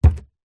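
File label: foley footstep single on wooden floor barefoot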